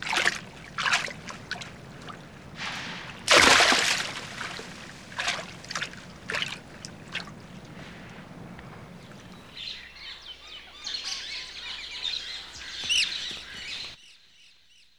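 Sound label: WATER BIRDS; ETHNIC; AMG; Global Trance Mission VOL-2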